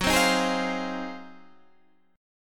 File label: G sharp 13th F sharp